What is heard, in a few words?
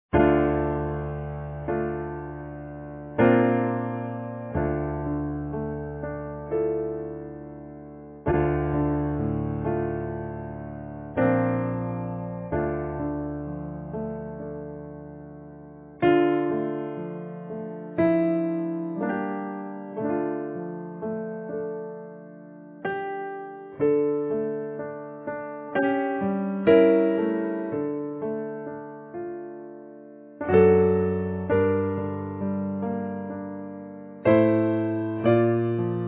Reflections piano